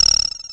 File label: vidgame capture; Weird and Interesting Hits; single hits